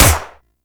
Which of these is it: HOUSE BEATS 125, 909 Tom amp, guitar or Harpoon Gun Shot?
Harpoon Gun Shot